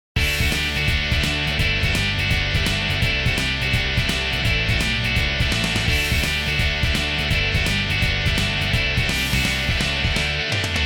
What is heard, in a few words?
Punk background music; Nokia; Audio themes